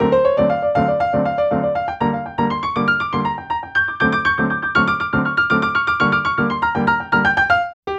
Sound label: Piano; Dr. Fink's Funk Factory